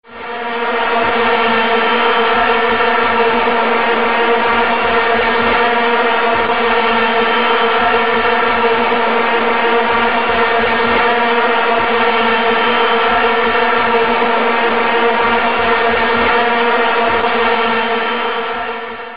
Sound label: Football ringtone